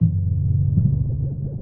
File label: tympani dj fx reverse; nova sound